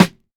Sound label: clean snare one shot f key